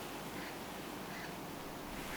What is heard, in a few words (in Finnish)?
ilm jokin suippopyrstokurppalintu